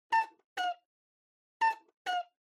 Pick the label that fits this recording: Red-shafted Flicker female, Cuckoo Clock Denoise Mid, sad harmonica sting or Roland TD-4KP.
Cuckoo Clock Denoise Mid